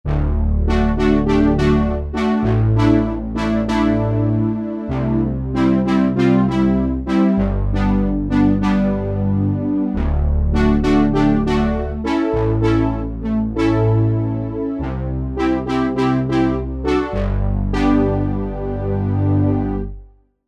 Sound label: Synth Brass, synth